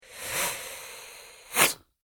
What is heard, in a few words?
Vampire Hiss And Bite; Monster Sound Effects; Horror Sound Effect